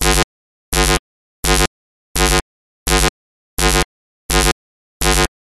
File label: science fiction alarm loop